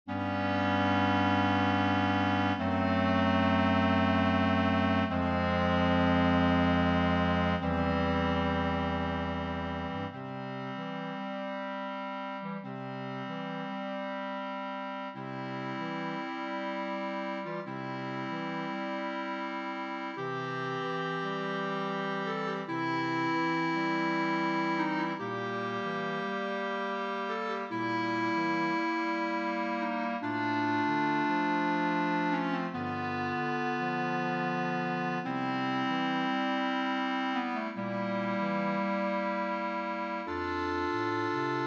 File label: Silent Clari